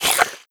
breath out, headcrab black; creatures

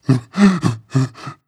breath long, zombie

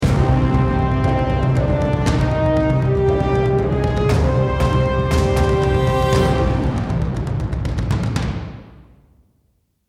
won round

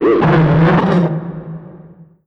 dog vox entry; npc; scripted

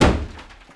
shutter; ambient; Counter Strike Model Pack; CS:GO

